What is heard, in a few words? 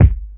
rich lows kick sound g sharp key; kick drum samples